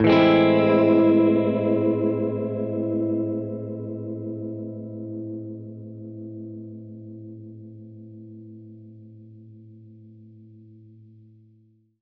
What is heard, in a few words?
Gretsch Chord Amin[maj7], Guitar Chords